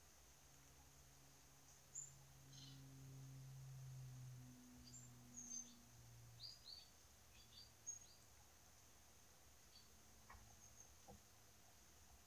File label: Aeroplane